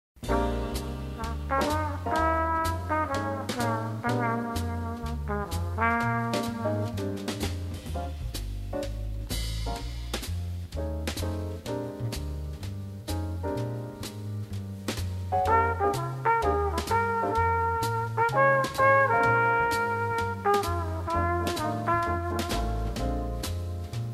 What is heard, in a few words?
Blues in Key of B flat concert exercice